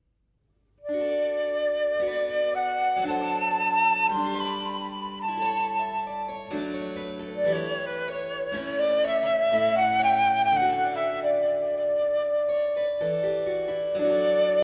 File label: Flute